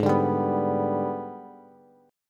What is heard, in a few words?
Ab Minor Major 7th Flat 5th chord, Bass; strummed; JGuitar